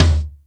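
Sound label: Acoustic low tom, Roland MC-303 Toms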